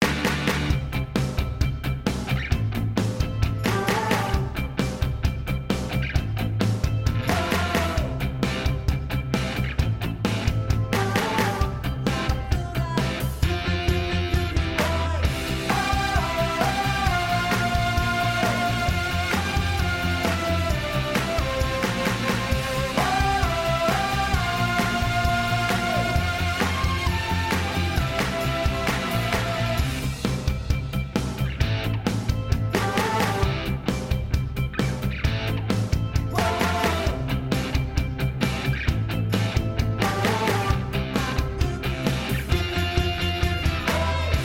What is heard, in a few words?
Karaoke Pop; the sims 4 city living